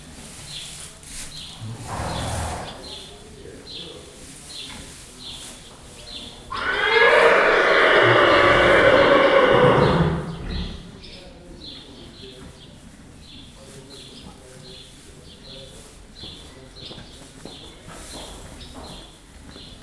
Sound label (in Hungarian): nyihogás, ló istálló; termeszetben